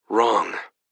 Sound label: wrong